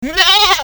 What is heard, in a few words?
Sheep Baa